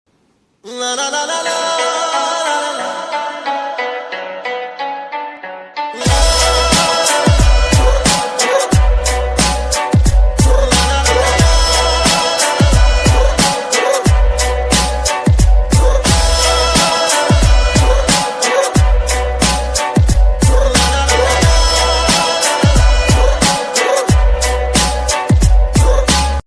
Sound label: instrumental ringtone